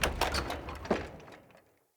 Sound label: Wood Double Door Open; UnderCity